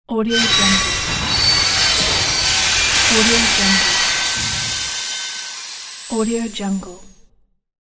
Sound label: screech train brakes; valinsound